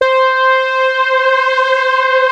FAT LEAD, Powered DX; Wizoo

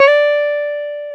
Jazz Guitar; Club-50 - Foundations; Jaz-Kessel

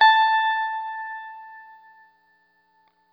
Guitar & Bass; Quantum Leap; 90's Sample CDs

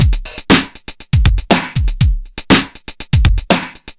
Old School, Drums Beats

